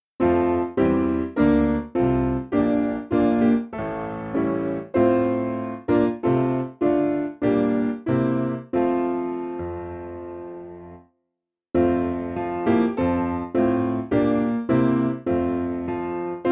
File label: Piano